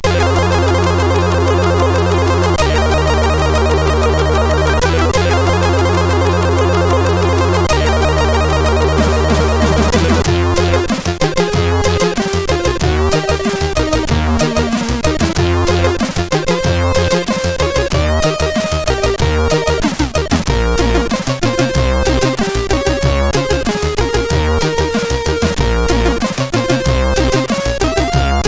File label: Only Cool, 8580